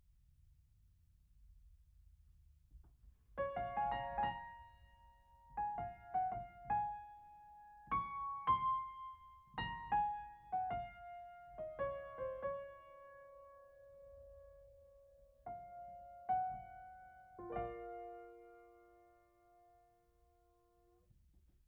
Piano Mono

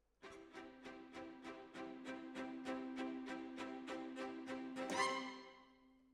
Decca, Jazz for piano

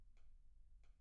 PIANO

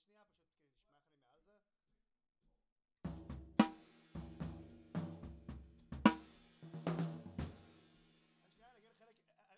Snare Top

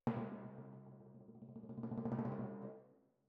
Timp Close

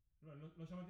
Piano Mono

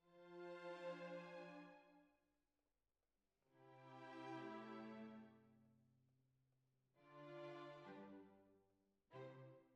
Flute

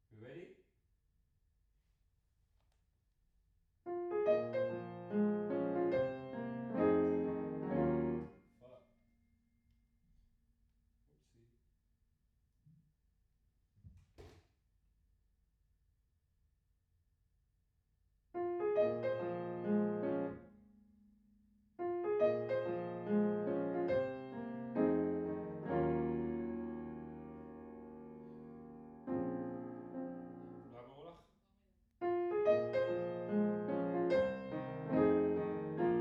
Piano Room

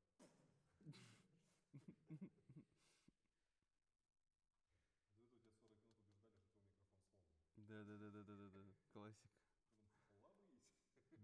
Tenor, RNR for piano

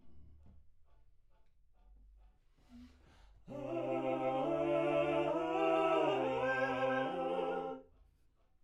Backs AKG LEFT

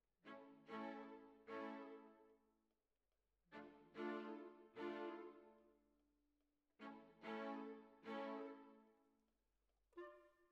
Violas Over

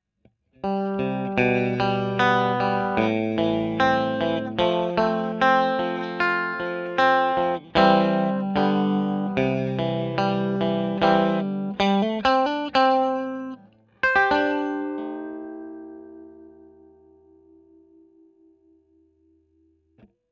E.GTR Royer

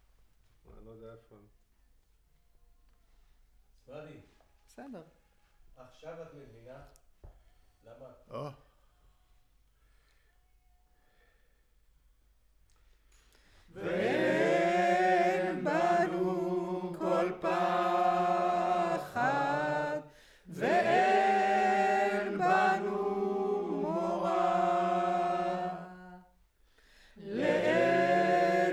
Choir

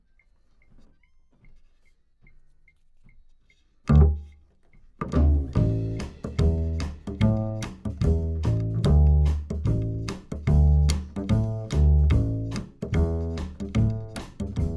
Bass

